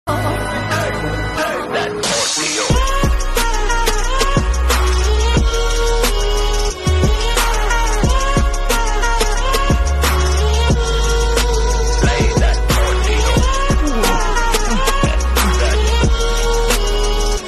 Random Edm Music Ringtone

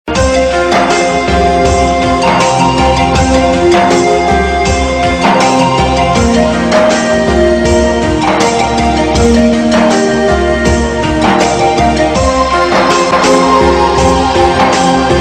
BG Tune; Shakuntala Dushyant; TV Serial Tone; Star One

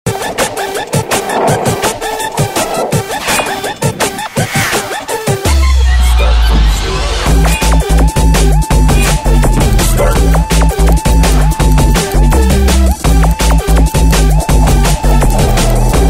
TV ad music; Cadbury Perk new 2014

